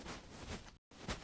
Move Sack; Civ4 warlords

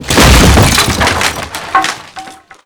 explosion wood debris close; Explosions

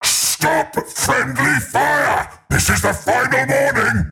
Friendly Fire Hit Alert; Warhammer Chaos; Warhammer 40000

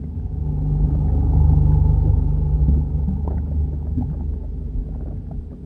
loading

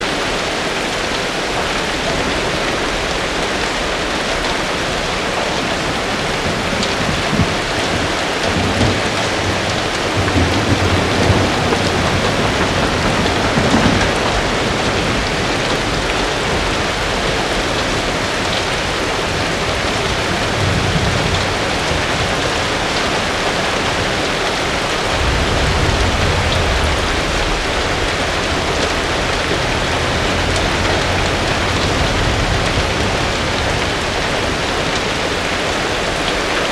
farm rain day; ambient

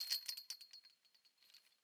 door chain link open end; Rust

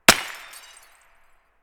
glass distant; Escape from Tarkov

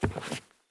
turn wood; Escape from Tarkov

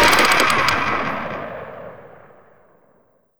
gatling cooldown; Modern Warfare; minigun

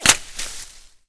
impact foliage; weapons; Modern Warfare

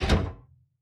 gear tail lock, aircraft; DCS

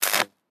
ambient port; World Of Warships; port3d

